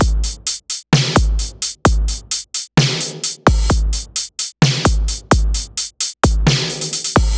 Beat Eliminator; Zero-G; Future Wave; Beats

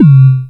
Dunk, Drums, Industrial

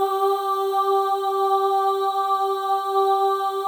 OH, FEM, Symphony of Voices; Spectrasonics

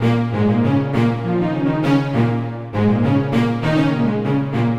String; Strings; Sonic Foundry (Sony Creative Software); Infinite Soul VOL-2

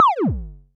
Short, Kick, Traditional; Multi-Kick; Long

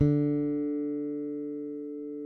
SPEC SUSTAIN, Bass

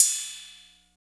OP HAT; East West - Phat & Phunky

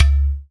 UDU; Spectrasonics; Heart of Africa

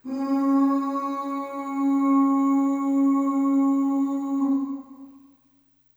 Ooh Long C#3 Short Reverb; Harmonic Building Blocks

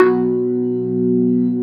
B3 B1, organ; AKAI S-Series CD-ROM Sound Library VOL-2

